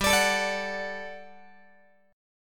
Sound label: G Major 7th Suspended 2nd G down Guitar; GM7sus2; strummed